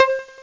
another piano

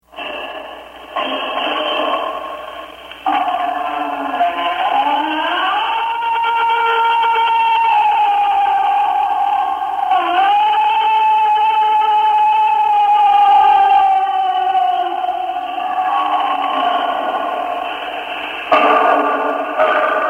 Big Foot Yell; Art's On-Air Sound Effects

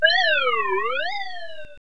btn; Designer Bodies

